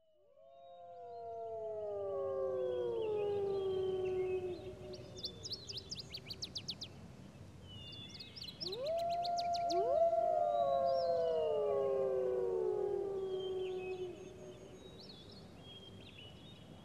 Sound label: Wolf Howl; Mystara; TSR 2502 Hail The Heroes